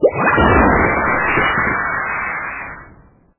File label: rain; 四川麻将